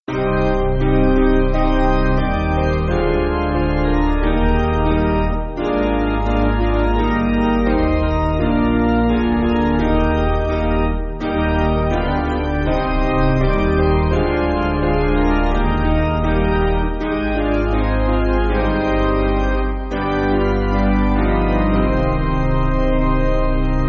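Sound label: Piano, church backing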